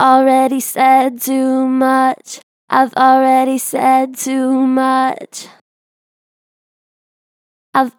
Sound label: Vib Already said too much, Vocals, Natural Vibrato Strong; Harmor Vocals